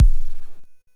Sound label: Drums, Hits; DCM8